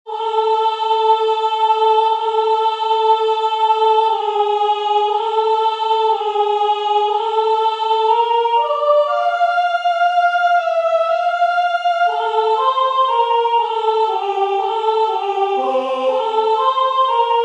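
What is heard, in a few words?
rocking soprano, singers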